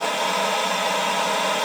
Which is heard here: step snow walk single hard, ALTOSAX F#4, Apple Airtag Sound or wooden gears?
ALTOSAX F#4